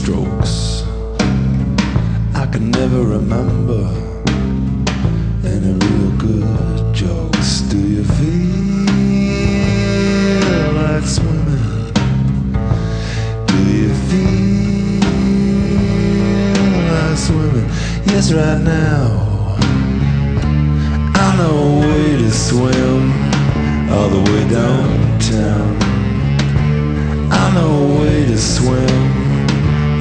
rock

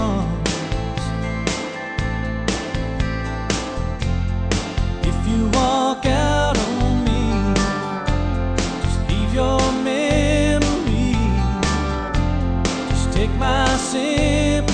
country

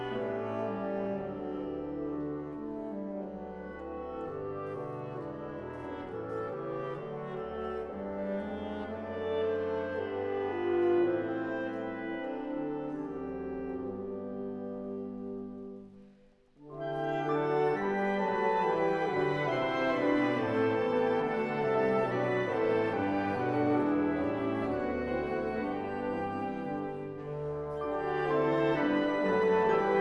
classical

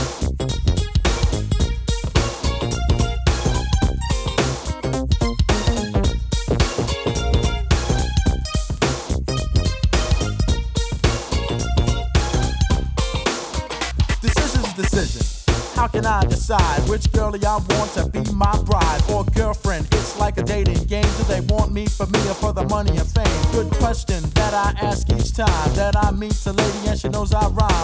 hiphop

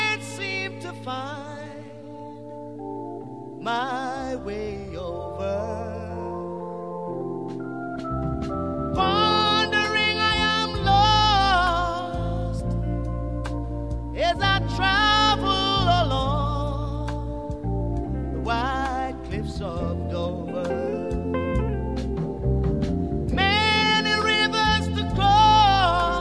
reggae

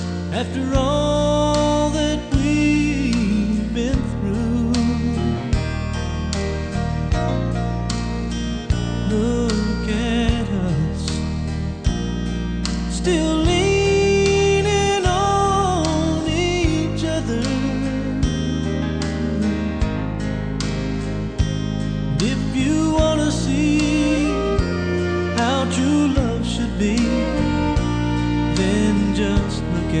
country

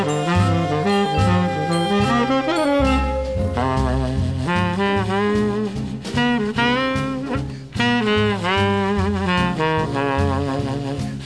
jazz

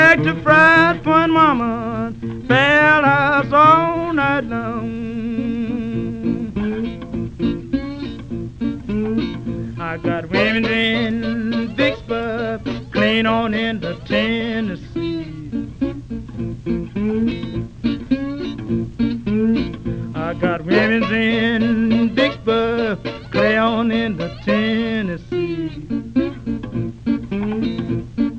blues

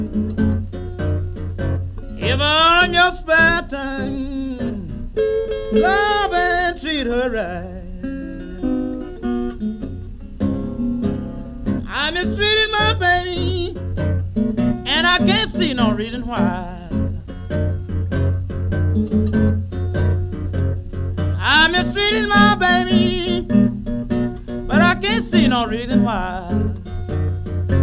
blues

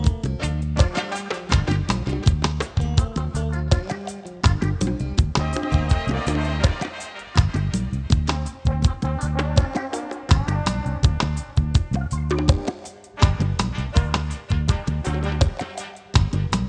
reggae

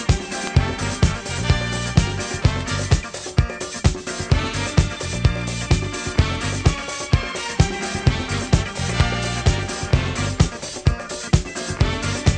disco

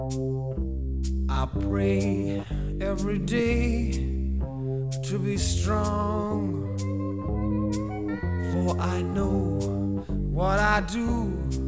rock

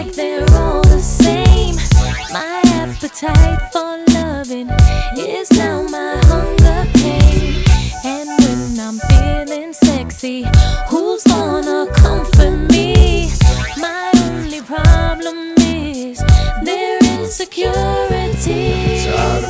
pop

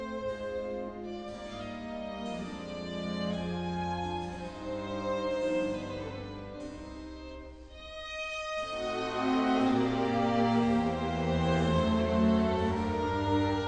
classical

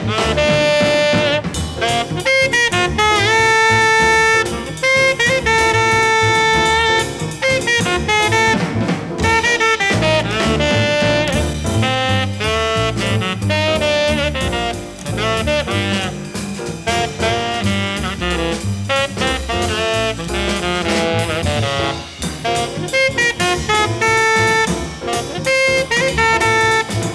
jazz

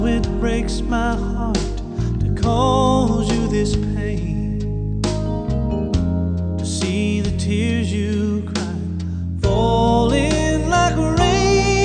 country

